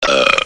burp

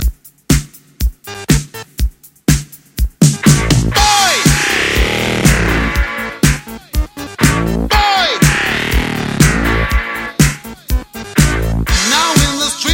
electric

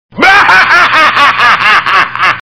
bahaheh

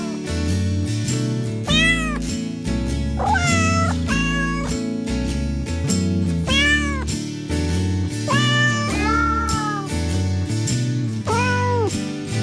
silent night

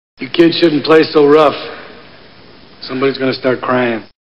crying

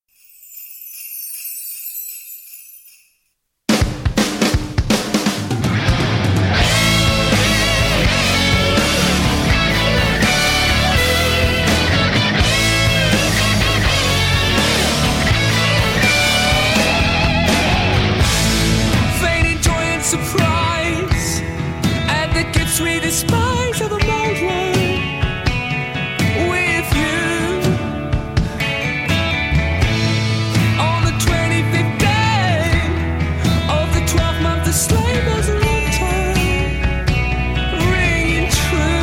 bells

